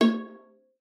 C#5, VIOLA FINGER; Xsample VOL-5

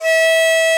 DIZI E4; E-MU Producer Series Vol. 7 – Old World Instruments

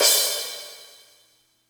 Crash Cymbal, Yamaha PSR E305

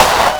Snare, Drum&Bass; 90 s Sample CDs; Total Drum&Bass

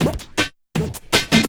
Drum & Bass, 160 BPM

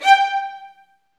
STR VN, Roland, STR Vls Marc; Roland L-CD702; Vlns Marc1-3

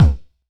Hammer Low Mid Tong, Kicks; Airwindows

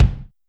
BD Room; YAMAHA RM1X

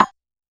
Rhythm Side Stick Hat, Realistic Concertmate-670; Rhythm Layered Kit

